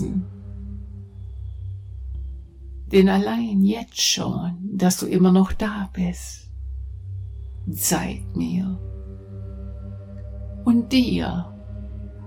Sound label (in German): tiefer und tiefer, binaurale beats